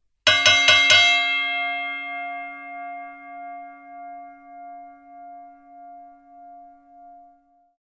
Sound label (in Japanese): ゴングの音; 2016 okinawa